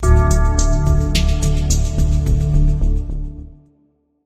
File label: top gun maverick bell; Soundeffekte; Klingelton